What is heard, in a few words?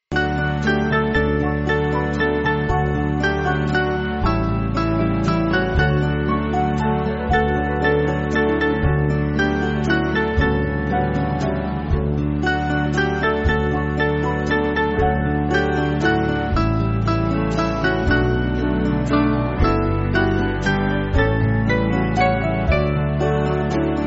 Band